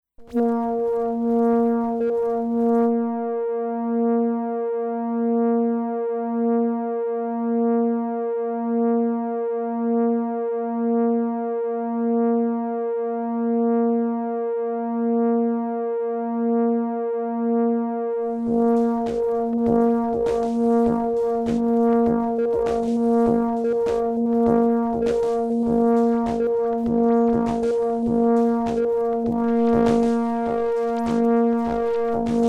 AM Drums Trigger

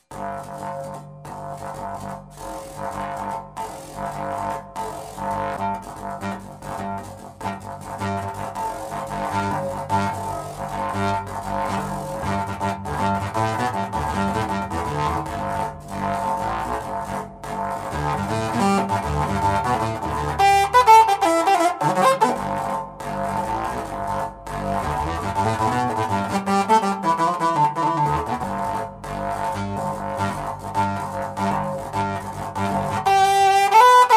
Working Beat

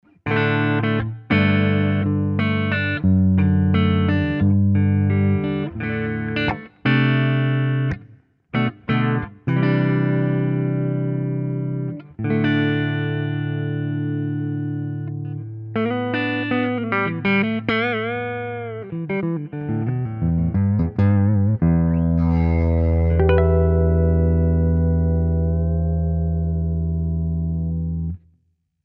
Les Paul Clean Neck Pickup; Slash Signature

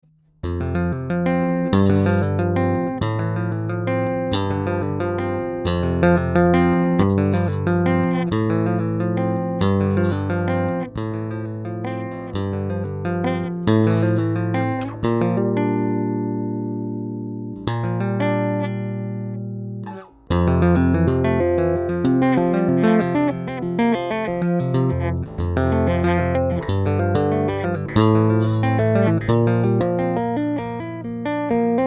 L-2500 Tapping

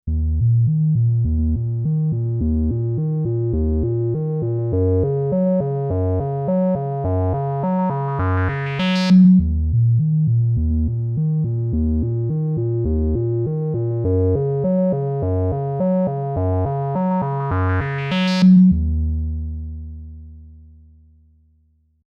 Heritage Audio DTT 73 Synthesizer Stereo

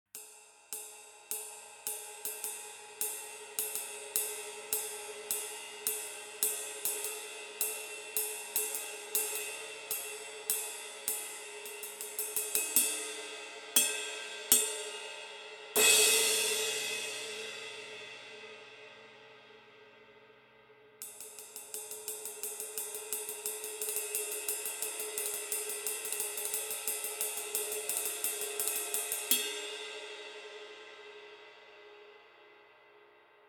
RIDE

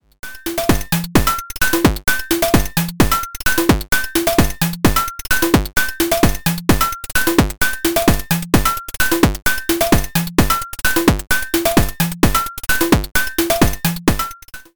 ALM Busy Circuits Motto Akemie Whistler Beat

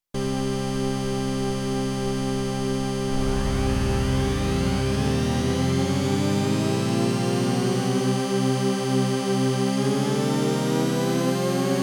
Studio Electronics Quadnic Chord 2xAdd

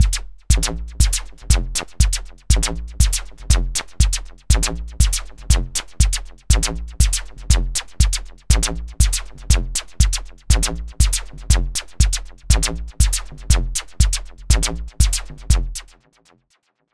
Dreadbox Drips V2 Delay bd

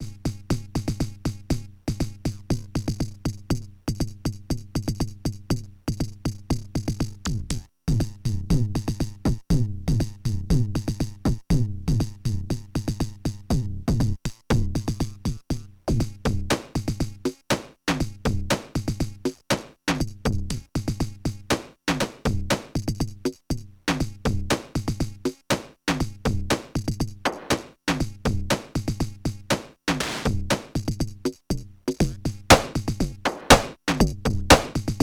Transient Plus Manual K CV Pot Movement; Future Retro

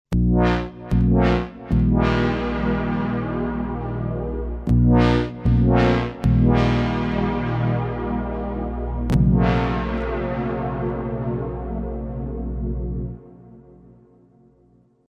3D PolySynth; Cobalt8

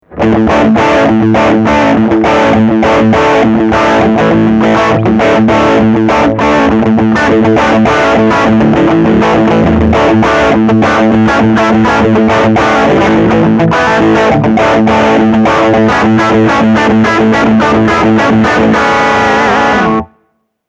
Preset Bank Hardrock

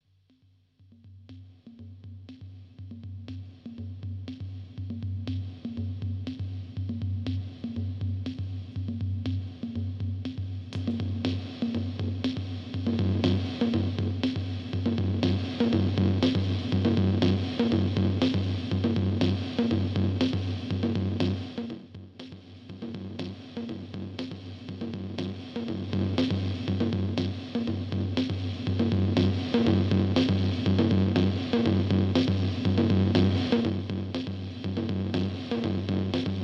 total sonic annihilation Drum Machine; death by audio

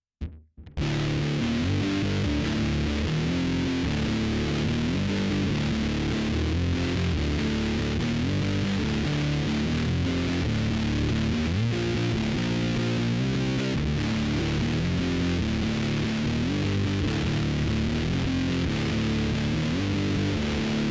Zoom Grunge Muff

